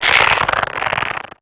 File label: choke; adron